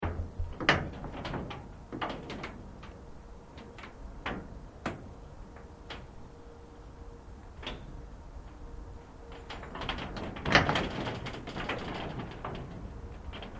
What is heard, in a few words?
FENCE CORRUGATED IRON CREAKING WIND LOOP, metal fence; Creaking (Sound Effects:Objects)